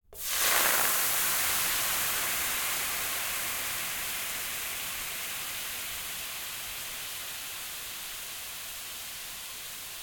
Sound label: SIZZLE Water On Pan Medium; Rock The Speakerbox